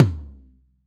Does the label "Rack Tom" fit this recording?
Yes